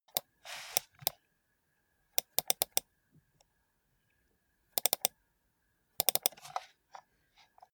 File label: computer mouse usage sequence short